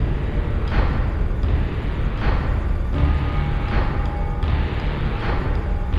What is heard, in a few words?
marching men, drum loops